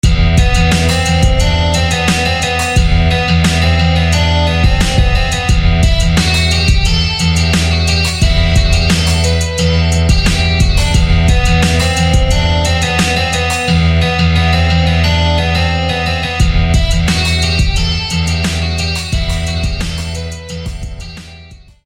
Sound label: Rugged Acoustic; SFB FX